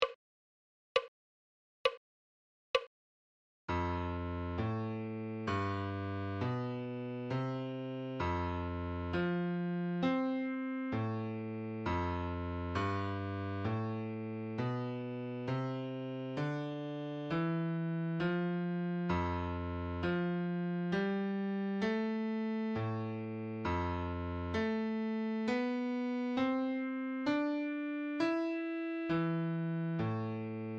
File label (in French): Clé de Fa, Adagio